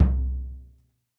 bassdrum, gretsch kit

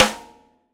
snare, gretsch kit

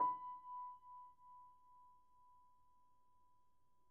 piano mf, steinway

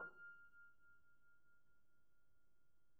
piano pp, steinway

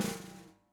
snare drag, gretsch kit

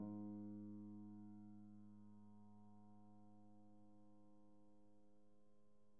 piano pp, steinway

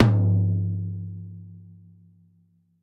tom lo, gretsch kit

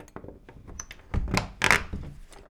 door knob

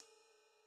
ride, gretsch kit

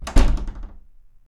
door shut

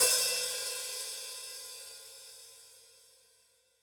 hihat open, gretsch kit

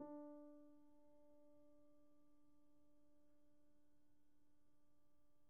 piano pp, steinway

